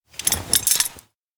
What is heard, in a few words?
parts take; anemoi